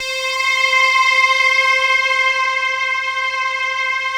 Microwave; Optical Media International; Sonic Images Library; Waldorf salad

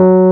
RHODES HRD; E-MU Formula 4000 Series Vol. 3; Analog Odyssey

